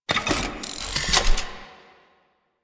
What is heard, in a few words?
Sticky Bomb Equip; Grineer; Veilbreaker; Vor